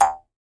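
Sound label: Jaw Harps, Pitched Percussion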